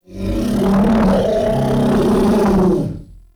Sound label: Ancient Tank Death, Enemies, Infested, Barks; Warframe